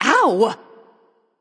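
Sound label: ouch, female; smite; voicepack; hera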